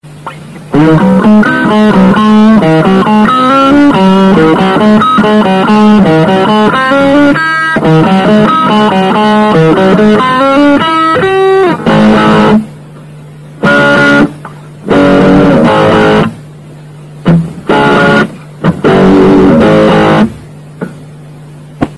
riff

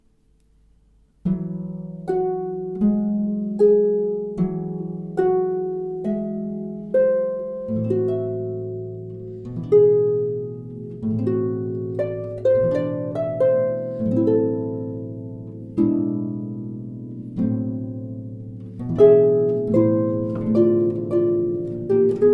played on the harp

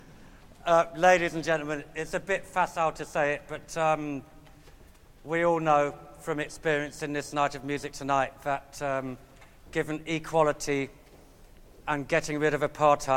vivaldi the four seasons violin strings; proms on tv

